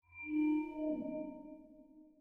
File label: logo click